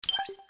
codec open

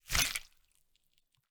die; pixel slime lagged

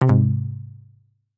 game over; pool 8

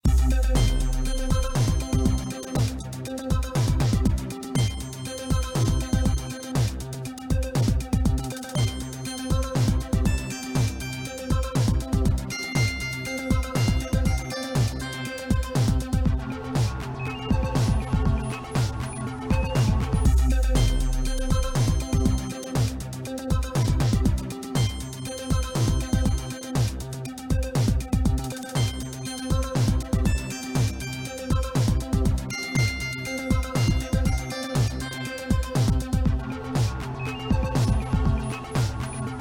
game music; stack jump

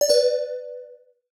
level no moves; match drop

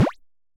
collide; mr pong

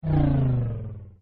car spawn; traffic command

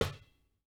ball slap; quarterback

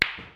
ball hit, pool; pool 8

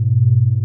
rolling bridge; ball rolling game

